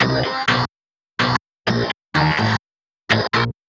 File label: Stab Stack; Sonic Foundry (Sony Creative Software); Synchro Funk